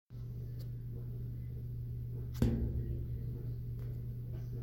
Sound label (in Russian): zahvat krishki musornogo baka